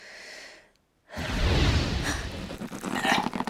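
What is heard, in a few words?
Swallow Success; Goddess of Trampling; Amazon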